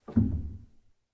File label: rel, Grand Piano; bitKlavier Grand; Piano Bar